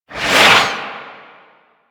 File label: Tank Missile Barrage Whizzby; Enemies; Abilities; Warframe; Lasrian